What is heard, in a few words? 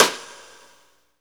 SNR, Roland, Reverbs; Club-50 - Foundations Roland